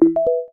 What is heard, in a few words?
UI Connected; BTD6